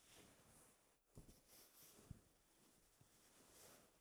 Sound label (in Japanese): 布ズレ